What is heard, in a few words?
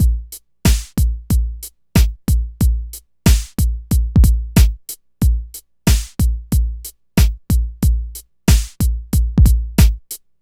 drm Ab, Hip Hop & RnB; Tantrum